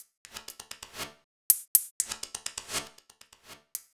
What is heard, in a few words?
Metallic Beat, Electronic Beats; Sonic Foundry (Sony Creative Software); Myths of Technology